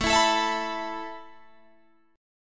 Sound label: A Suspended 2nd A Guitar Drop D